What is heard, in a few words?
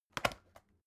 folder close soft